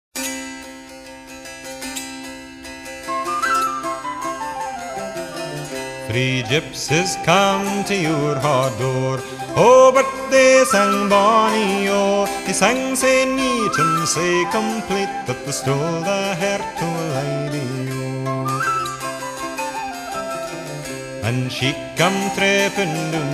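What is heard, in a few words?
harp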